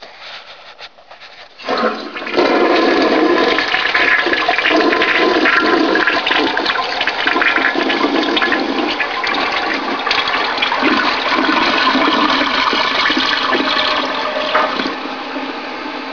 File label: flush